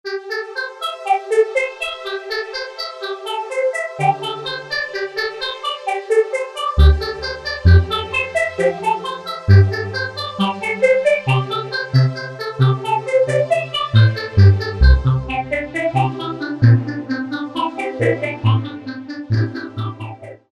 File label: Waveaura, Generalmusic Equinox